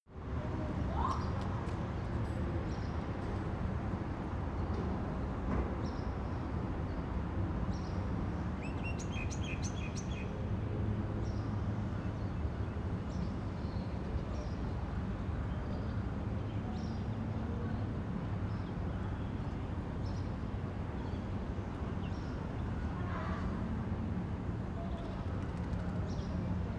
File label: day traffic birds